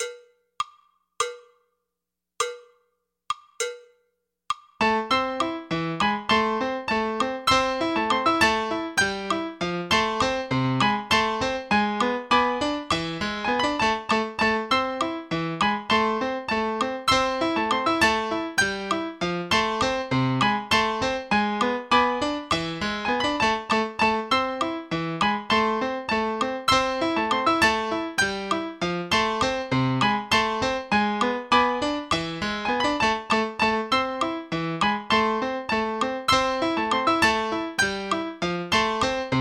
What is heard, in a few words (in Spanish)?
estudio slow